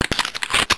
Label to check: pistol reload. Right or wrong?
Right